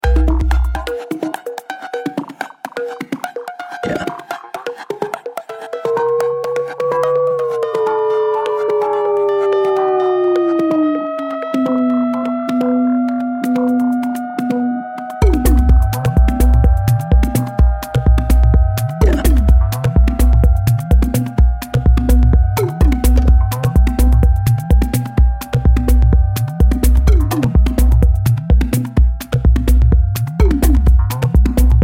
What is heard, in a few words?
typewriter mix; mario kober; kober guse mix